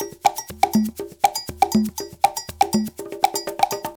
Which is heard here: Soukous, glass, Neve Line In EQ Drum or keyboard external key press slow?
Soukous